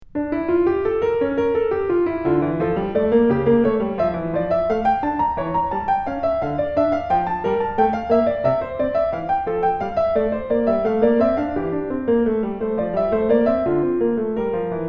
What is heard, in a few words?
Invention Piano; Bach